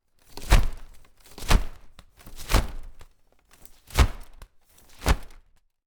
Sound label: INT Cloth Frump Thump; Transitions; Poetry Project